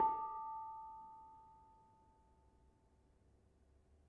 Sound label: Bonang Barung, gamelan